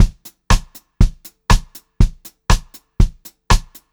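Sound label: 120 pop variation, Pop-Rock Drumloops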